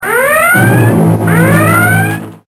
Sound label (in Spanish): alarma